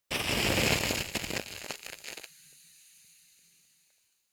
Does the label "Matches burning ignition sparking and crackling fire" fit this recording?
Yes